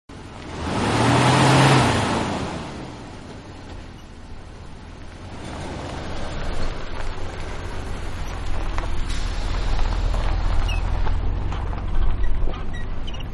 Accelerate Idle Drive Stop, Van-Accelerate, Idle, Drive And Stop Engine-Exterior; Car Sound Effects; Manhattan Production Music